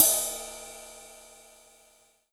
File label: Korg NS5R Ride Cymbal